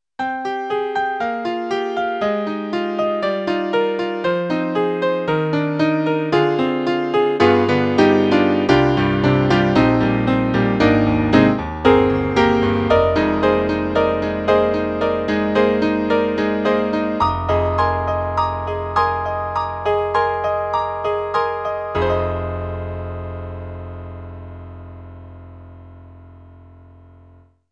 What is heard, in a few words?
GS Wavetable SW Synth Grand Piano; Microsoft